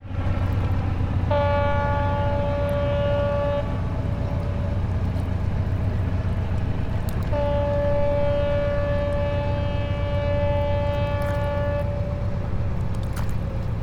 port

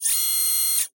arm action, machine

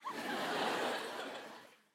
people studio laugh small

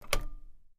key tighten

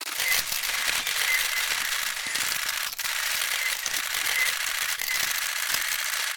camera press conference shutter

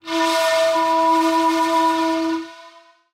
shakuhachi

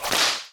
whip attack

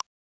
cursor, button

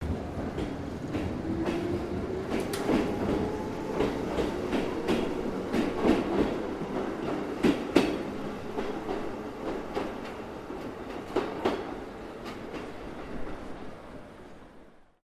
train start

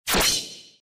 katana slash

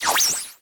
jump anime

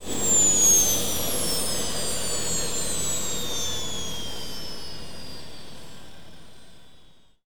helicopter engine off

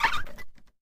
doorknob

